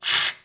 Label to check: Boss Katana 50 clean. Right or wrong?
Wrong